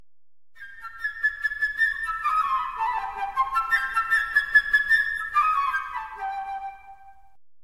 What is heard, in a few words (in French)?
oiseau flute traversiere